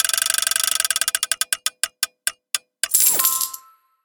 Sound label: wheelspin